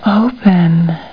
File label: open